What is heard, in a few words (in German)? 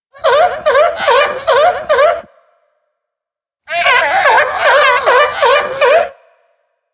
heuler